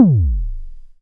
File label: MS20 TOM; INIS - Funky Xtreams; MS-20 KIT